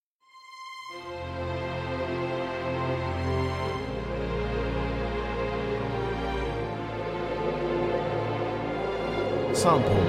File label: beat